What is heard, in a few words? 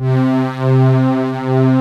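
ANALOG PADS; Pro-Rec - Synthsonic; TRINITY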